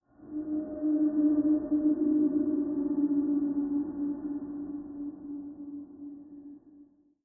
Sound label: Distant Pod, Ambient